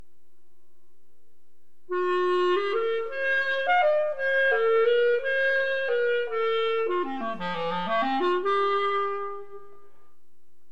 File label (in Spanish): clarinete; instrumentos